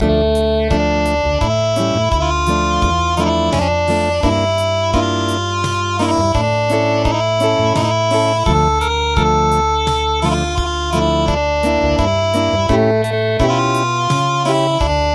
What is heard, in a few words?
Acoustic